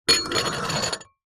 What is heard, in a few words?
household wine glasses x3 slide together on wooden surface